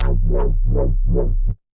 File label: Reese Wobble, Bass; Ableton; Skitter and Step